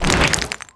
Woosh

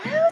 hit

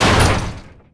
Door HIT Metal

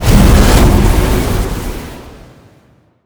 Boss Summon; ChaosBoss

